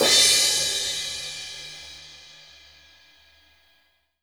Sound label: Crash, Live Kit; Sonic Foundry (Sony Creative Software); Ugly Remnants VOL-2; Single Shots; Beatstuff